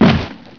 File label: crate down; objects; Heretic II Demo